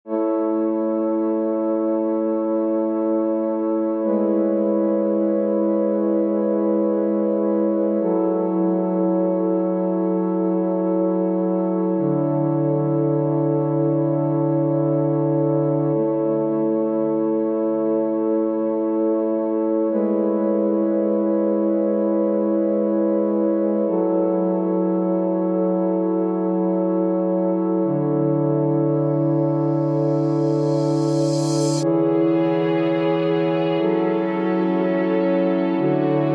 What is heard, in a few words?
Beat; eerste demo